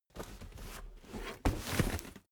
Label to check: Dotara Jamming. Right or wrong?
Wrong